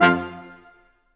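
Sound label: BRS Q.SHT, Roland, brass staccatos, quintet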